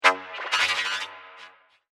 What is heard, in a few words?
Robotic Wiggle Droid, science fiction sound effect